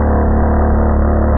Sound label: Bass; alone in the dark 4